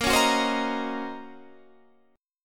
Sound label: D sharp Minor 13th A sharp, chord